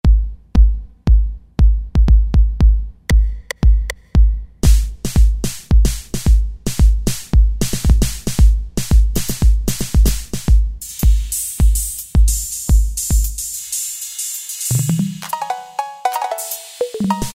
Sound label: EDrums, Kurzweil K2000; Kurzweil K2000 Contemporary ROM; Contemporary ROM Programs and Demos diskette